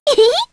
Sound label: Vox Laugh; Hanus; kingsraid